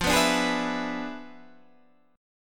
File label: E 7th F, guitar; strummed